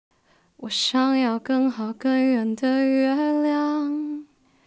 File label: Alto